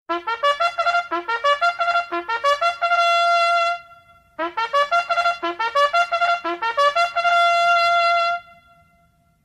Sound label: Military Taps